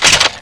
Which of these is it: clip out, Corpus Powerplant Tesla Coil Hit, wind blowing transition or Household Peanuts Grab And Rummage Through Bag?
clip out